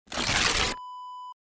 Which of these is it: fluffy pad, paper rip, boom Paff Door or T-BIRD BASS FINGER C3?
paper rip